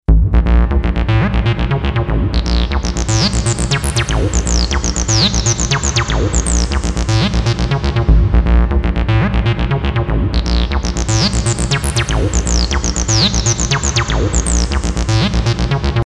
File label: Reverb